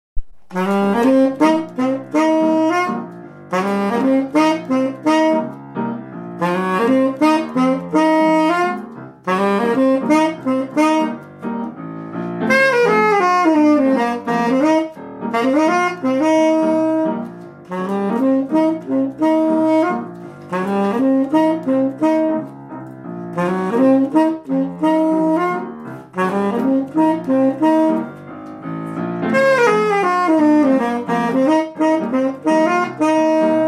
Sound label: riff laden